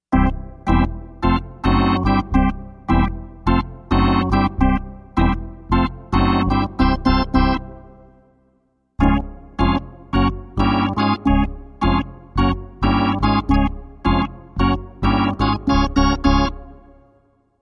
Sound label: organ; lets go team